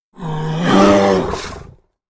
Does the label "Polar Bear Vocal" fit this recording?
Yes